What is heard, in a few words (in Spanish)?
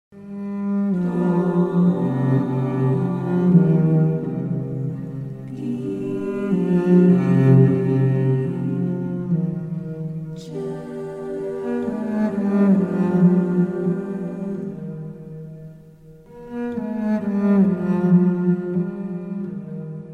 cello con voces